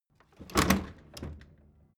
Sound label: door flap loft impact hard